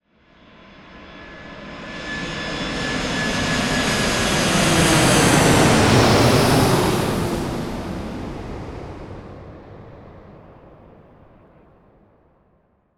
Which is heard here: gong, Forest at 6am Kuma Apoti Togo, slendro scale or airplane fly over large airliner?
airplane fly over large airliner